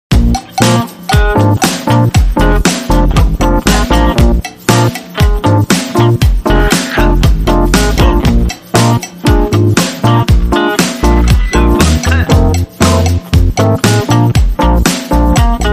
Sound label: Disco Ball Boogie